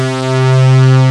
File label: MOOG BASS; Kid Nepro; Elite